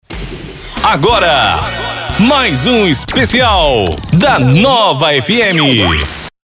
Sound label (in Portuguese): vinheta